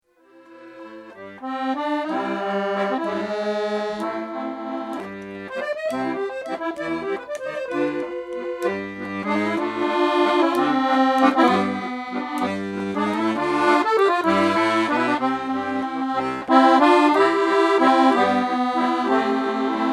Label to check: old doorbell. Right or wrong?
Wrong